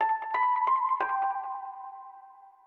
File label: ethno, 90, D